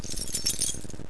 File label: found key`s